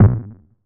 Kick

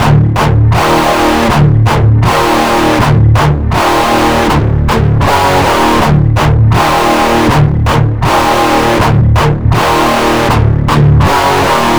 160 guitar loop amateur metal Dmin; COUNTBALDOR

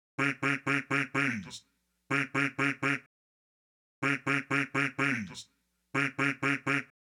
Vox

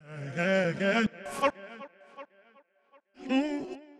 Vocal Loops 120 Gmaj

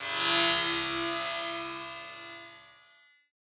abstract fx

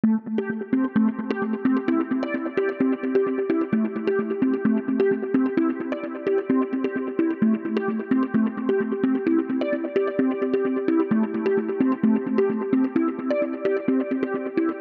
SYNTH

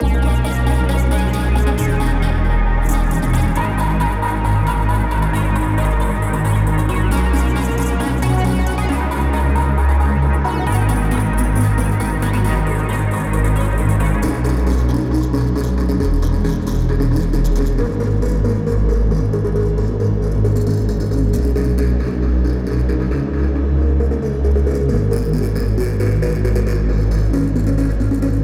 G Min 135 BPM; Cymatics; Photosynthesis

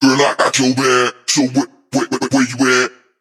F-m 78 chopped vox Chop N Screw

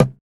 Drums Tap Percussion C

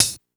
Open Hat

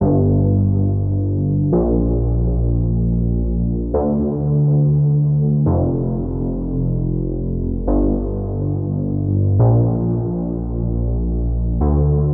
Intro Bass 122 G

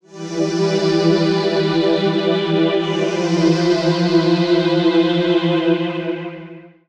174, E, Synth Loop

